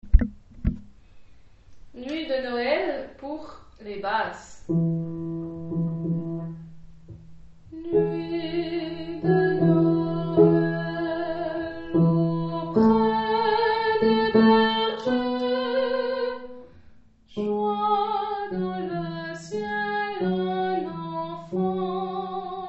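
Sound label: nuit de noël basse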